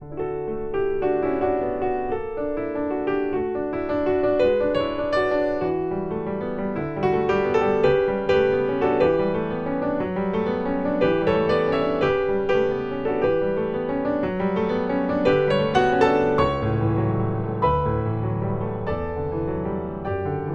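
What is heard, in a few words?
piano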